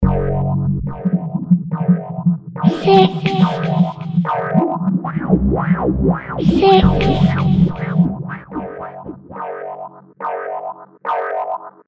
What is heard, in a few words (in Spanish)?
efectos sonidos ciencia espacio